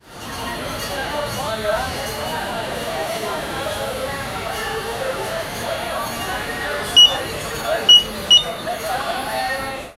sounds of a traditional, arabic

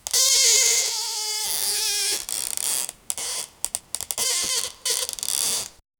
soft creaking wood quiet; atmospheric

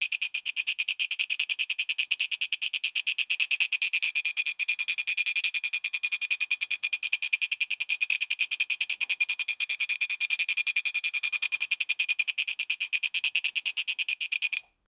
sound brazilian phonk; cultural

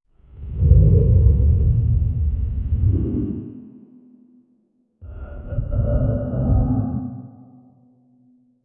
low underwater rumble sini; wood cracking

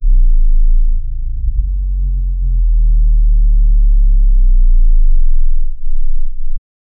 futuristic charging up, charging

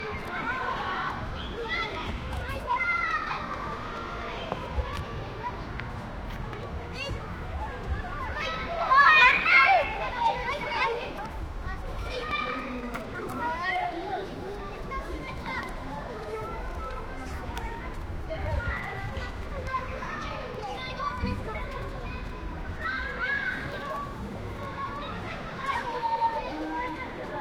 children playing at a pla, playground

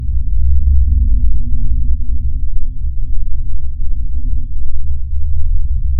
low human crowd murmur in, indoor ambience

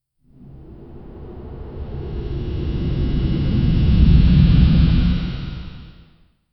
poweful spectral ghostly; bear

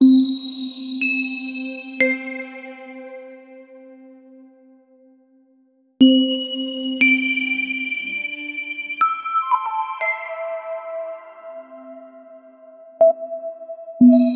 cool fx for a circles, rhythm game

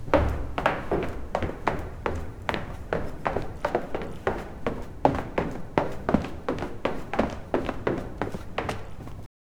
architecture

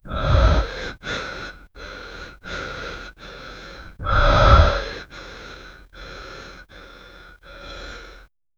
calm human breath

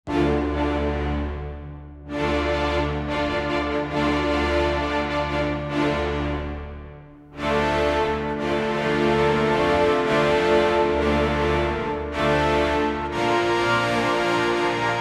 heroic orchestral battle; epic

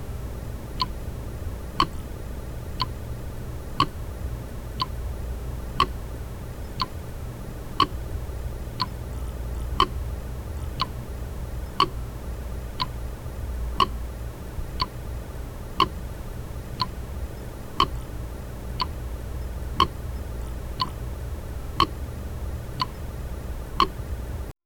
a ticking clock breaks

